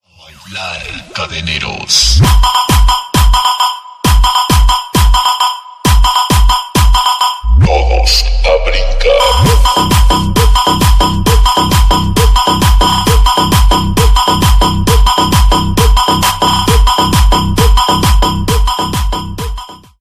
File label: Tribal Dance